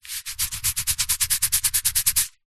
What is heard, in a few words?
itch scratch skin hairy; human